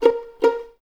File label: Violins A#4 Pizz, String Ensembles; Miroslav Vitous; 11 Violins